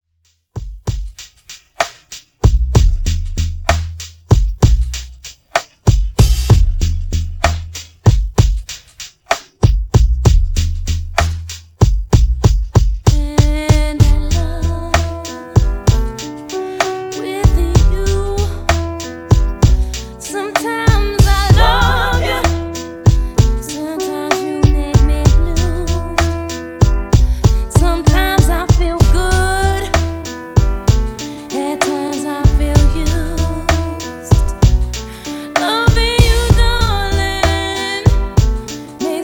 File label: Extended 64 Bpm